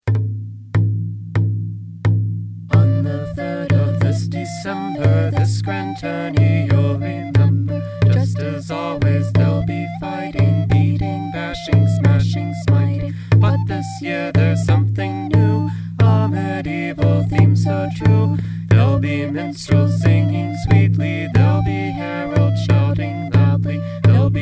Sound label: grand tourney jingle